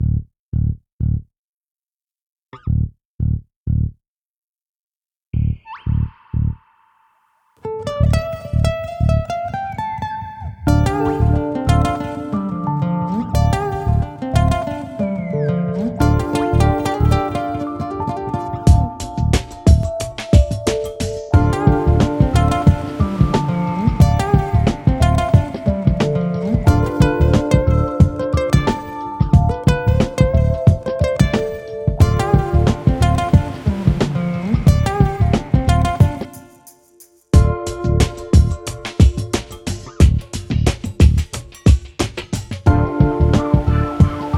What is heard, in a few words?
Jazzy Feel Easy Chill 90 BPM